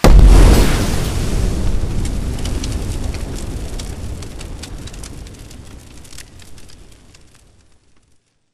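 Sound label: explosion close kaboom; corporate; Titanfall 2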